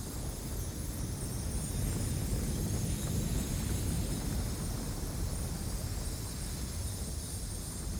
terra conversion loop; background

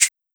Shaker; Drums

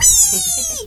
wee; Digital Paintball 2; player

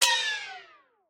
terra switch fail; puzzle

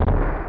Dash Into Wall; Open Zelda; Quest Designer 2.0.4b

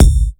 Kick Partial

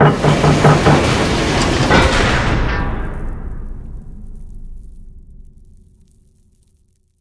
Cave Entrance Close; Journey

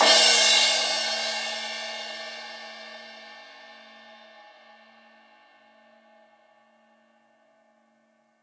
Crash, Drums, Cymbal; One Shots; Bluesbreak; Ableton Live 12 Lite; Core Library

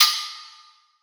Hihat Open, drums

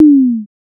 Tom; Ableton Live 12 Lite; Core Library; Drums

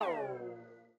floor switch fail; puzzle

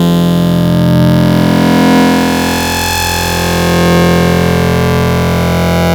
Vector Sprite Complex Xmod Drive; Ableton Live 12 Lite; Sprites

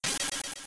squirt; Super Smash Land

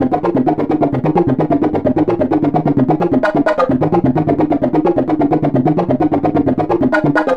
Chugged Arp Fmin 130 bpm, Synth; Loops; Tonal; Ableton Live 12 Lite; Core Library

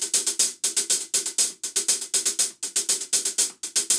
Hihat Funk 120 bpm; Loops; Drums; Top; Ableton Live 12 Lite; Core Library

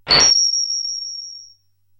flash; mmx sfx

